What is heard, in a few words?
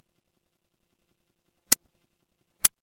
office pen